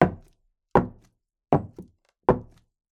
Footsteps Female - Thick Heels Wood Slow

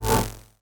Whoosh Electric; little robot sound factory

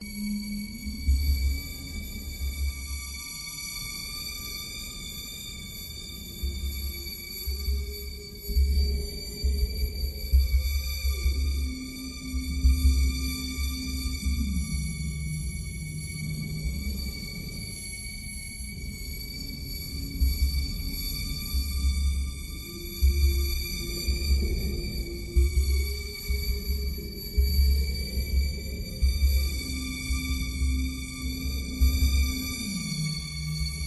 space drone; Audio Mangler

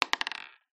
marble down bounce wood floor; zapsplat

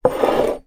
household mug slide wooden bedside table; zapsplat

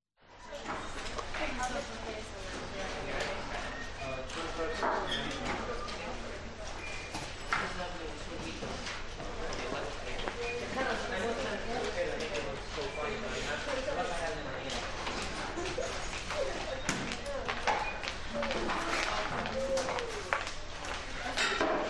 Library; audio hero

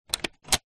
cassette vhs top flap open close

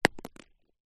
impact rock small hit solid ground

